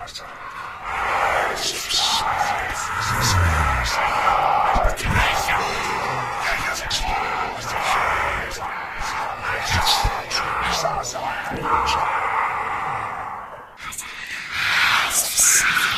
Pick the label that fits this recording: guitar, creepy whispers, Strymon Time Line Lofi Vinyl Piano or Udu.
creepy whispers